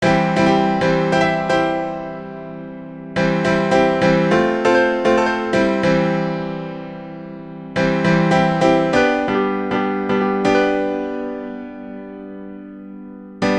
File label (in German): Klavierstunde